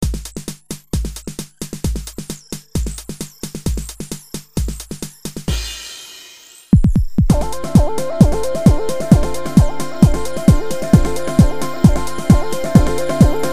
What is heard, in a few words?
Padsyn